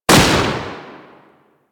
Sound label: Sapient Pistol Shot; Warframe